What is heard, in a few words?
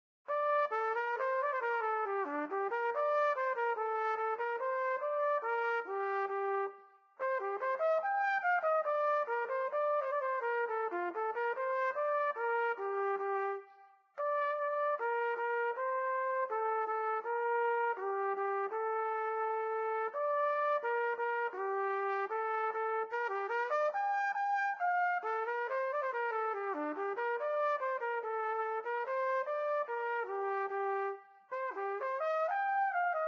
Trumpet; Zomboid; Lifestyle